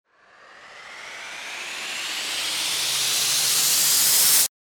RISER

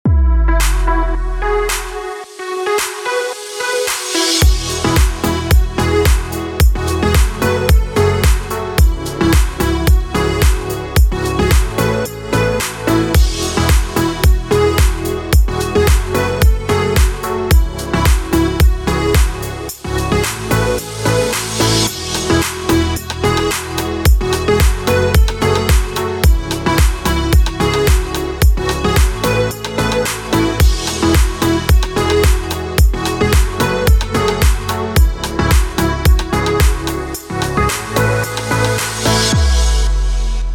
BED TROPICAL HOUSE EMAJ 110BPM

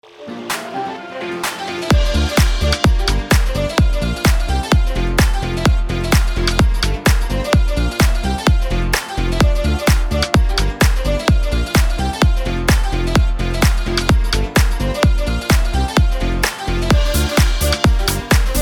BED ST. PATRICKS HOUSE AMAJ 128BPM

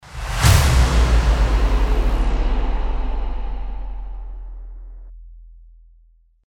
IMPACT

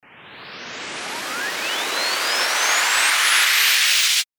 RISER

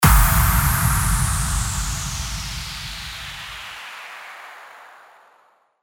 IMPACT

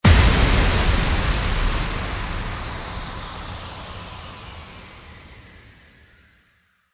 IMPACT

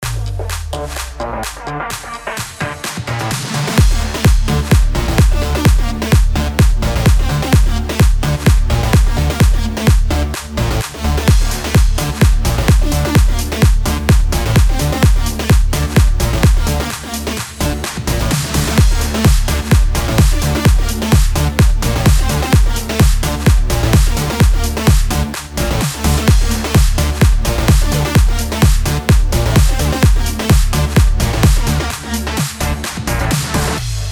ELECTRO HOUSE DMIN 128BPM

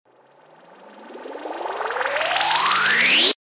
RISER

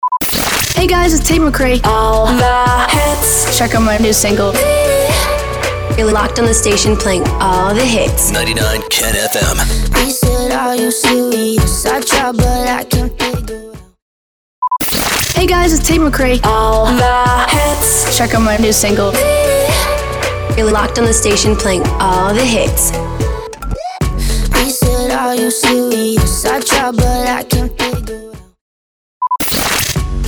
POWER INTRO 111BPM; SWEEPER

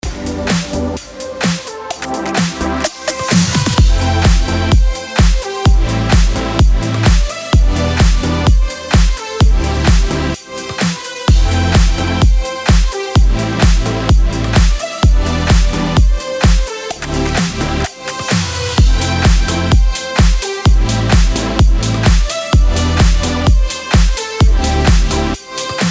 BED ELECTRO FMIN 128BPM

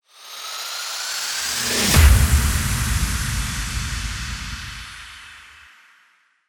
RISING IMPACT

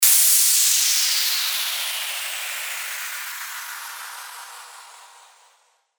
WHOOSH

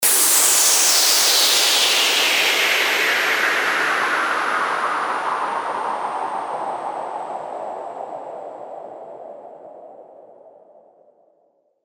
WHOOSH

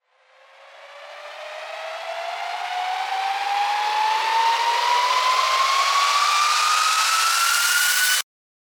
RISER

